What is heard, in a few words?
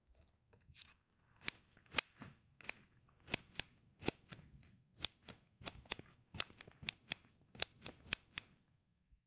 chopping potatoes; food preparation